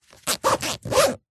foley luggage backpack zipper rough zip

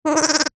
cartoon animal lamb sheep baa

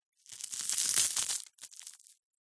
food cereal wheat biscuit snap break; zapsplat

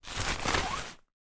household camping chair in canvas cover pick up

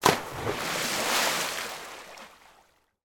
water splash; sound effects one

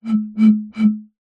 bottle beer empty blow air across top

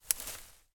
impact twig snap from branch

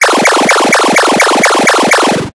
game retro laser repeat level complete

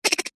cartoon laugh mouse high pitched

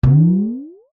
cartoon bounce drum like rising tail; zapsplat

